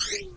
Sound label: squeak